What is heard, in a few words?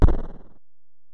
EPerc, Alesis DM-PRO; 300 Drum Machines